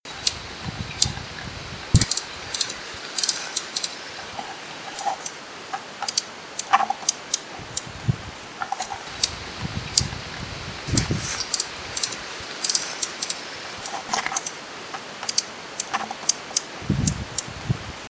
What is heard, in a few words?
Horned Sungem, پرنده